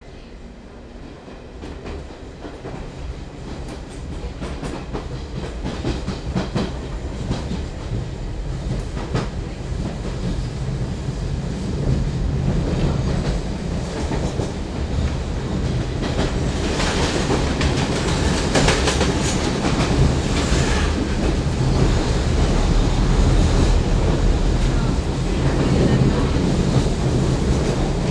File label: train subway london; best service Blue Box